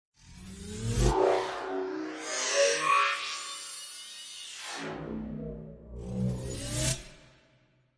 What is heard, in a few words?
Storms Meteor; Gothic Storm Music; Advanced Sound Design 2012